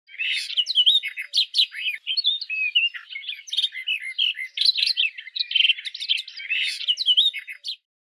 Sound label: Barred warbler chirping